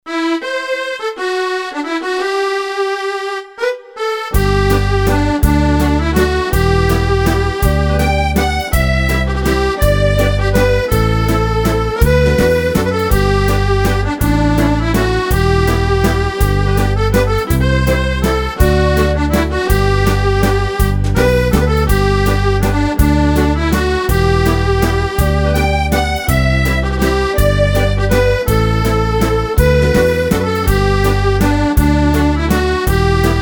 SCOTTISH WALTZ, accordion

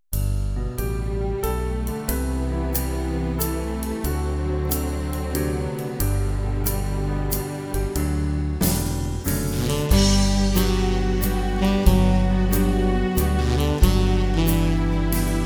MODERN WALTZ, accordion

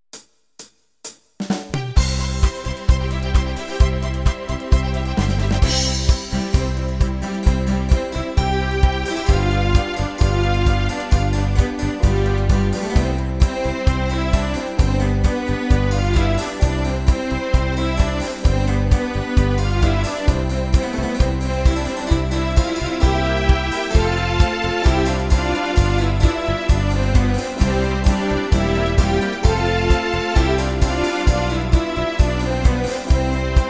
BAROQUE, accordion

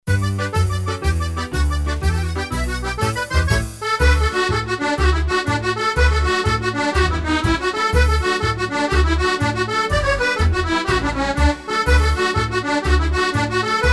IRISH JIG, accordion

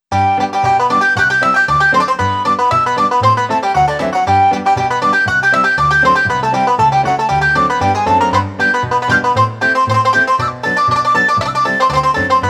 Whistle Banjo

